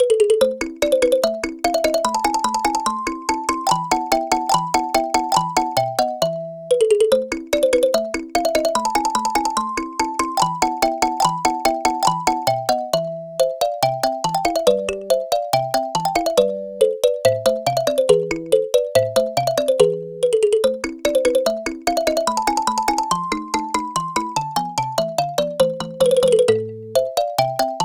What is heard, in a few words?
kalimba